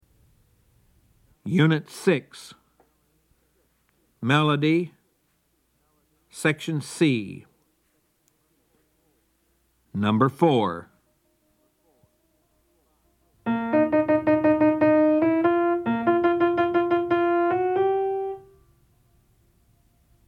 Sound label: melody, ear training recordings